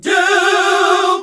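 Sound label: Gospel; Best Service Gigapack 1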